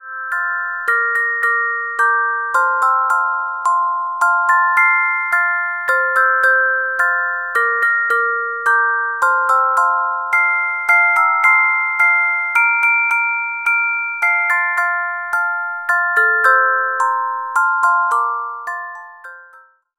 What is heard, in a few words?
Carillon Music Box Melodies